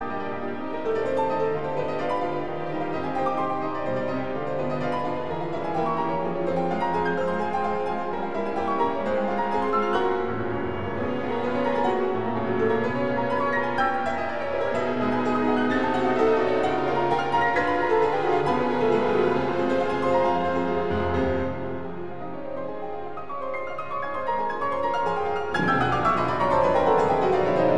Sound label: piano concerto f minor; chopin; no 2; mvt1